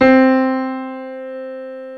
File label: piano